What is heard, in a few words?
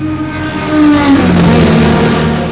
CAR RACER; TRANSPOR